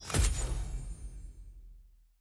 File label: purchase click; clash